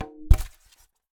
Polystyrene Rattle

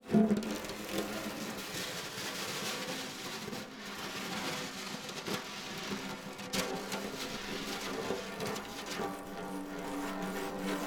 Snare Drum; Percussion Samples

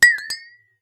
Water Clinks Mono, Glass Clink In Water

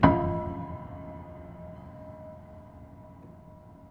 Hamm Perc Ped, Piano Hammer Perc Ped; Piano Miscellaneous

